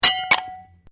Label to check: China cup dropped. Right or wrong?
Right